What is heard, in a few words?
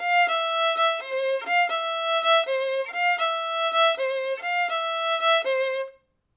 violin